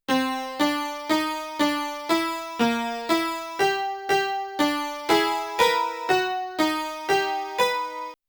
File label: Sting